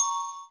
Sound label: Bells